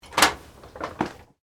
Door Open Glass, Foley, Door, Open, Glass, Storm, Door; Blast Wave